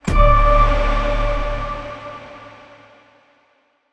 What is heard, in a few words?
Team Score